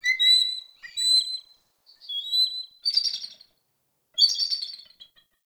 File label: Ambient Bird Calls; Ambience; Warframe; Duviri